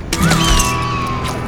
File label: begin, peacekeeper weapons; garrysmod